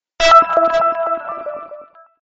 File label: Tone, Message; i-mobile; ZAA-1